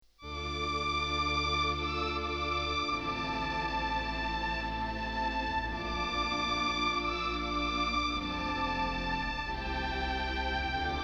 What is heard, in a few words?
string melody, Hohner